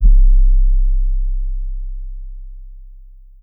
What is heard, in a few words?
808 Boom